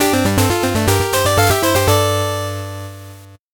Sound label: 8bit jingle normal